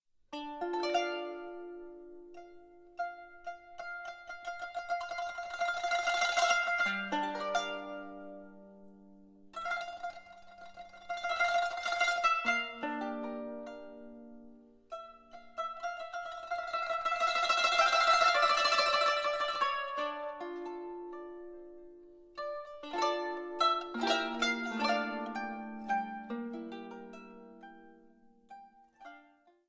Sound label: Prayer Instrumental